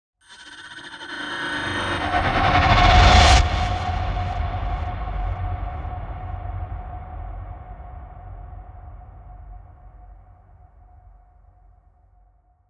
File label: sting; heavyocity gravity